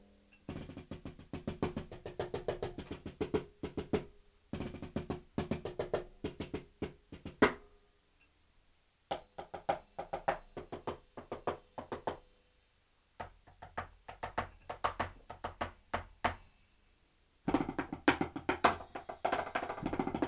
EFNOTE Mini 1 Emissionen

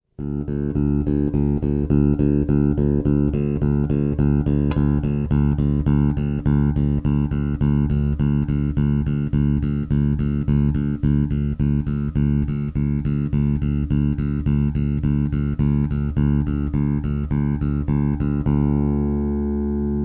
Bass Preamp Mid Regler; SkarBassOne

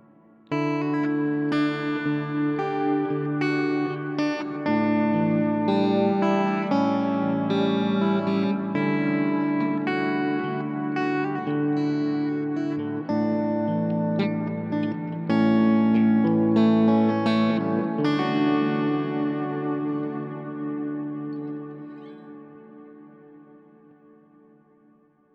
Ambient Lush Mittelstellung; Walrus Audio Fundamental Ambient

